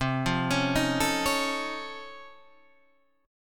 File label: Db Augmented Major 9th, C